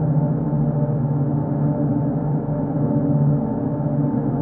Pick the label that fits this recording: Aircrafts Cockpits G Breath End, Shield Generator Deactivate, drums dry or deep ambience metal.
deep ambience metal